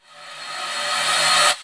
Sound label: Uplifter